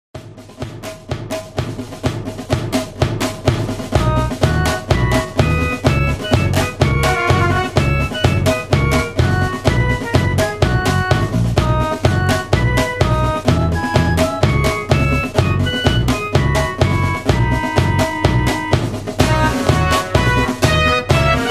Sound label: Hoedown Patter High Key